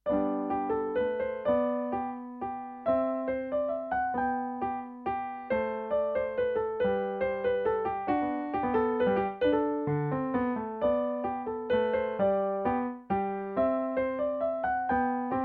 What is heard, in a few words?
Menuet, piano